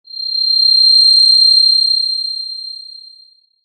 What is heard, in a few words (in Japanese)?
キーン